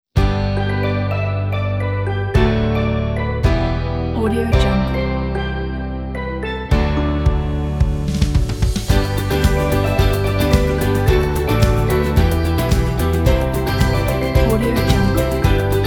island upbeat summer beach

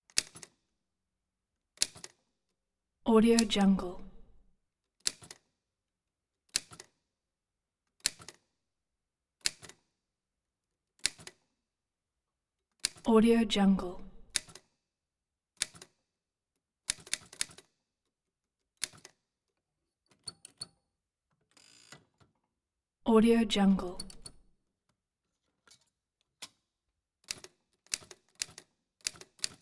typewriter single key hits 1920s remington

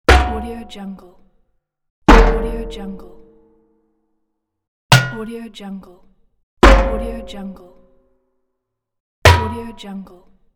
iron impact

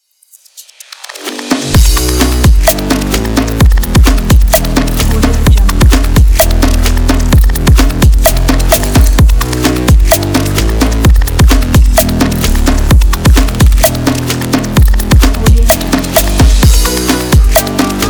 dynamic beat

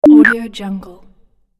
hud or ui device

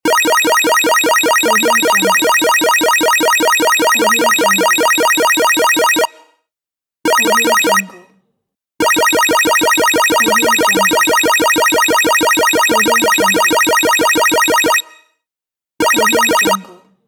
jackpot; rawaudiolab

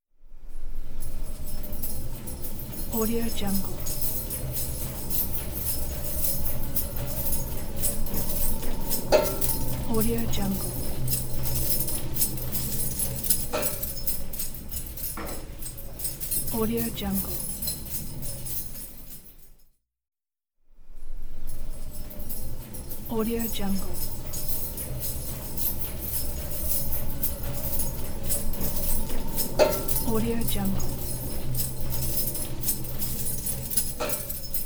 custodial hand truck cart rolling

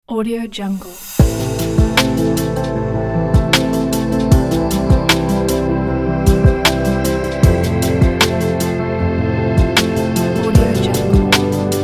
abstract inspiration

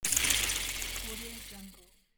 alien hiss

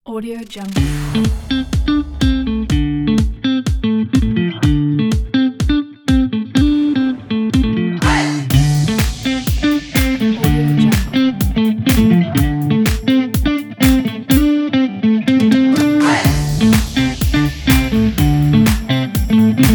cheerful uplifting motivating funk; myopus

